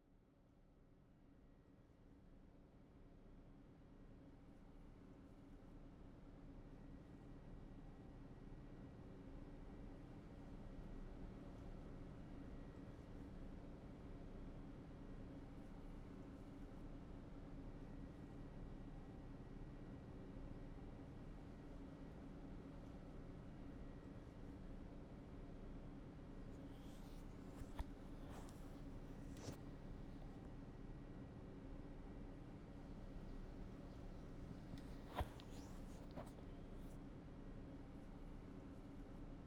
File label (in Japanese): ASMR 左耳耳かき; 「紅の左耳耳かき」